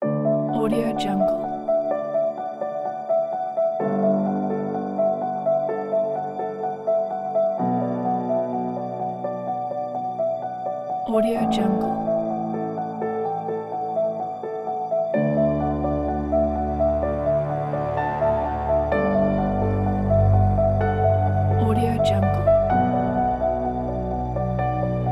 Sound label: romantic vibe